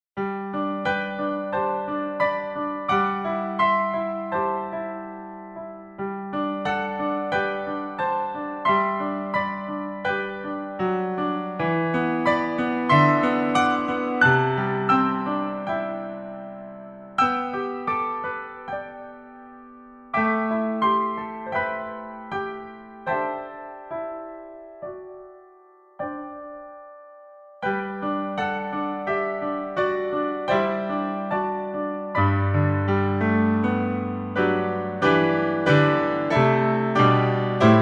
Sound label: Piano Solo Doxology Original Key G; Hymn Charts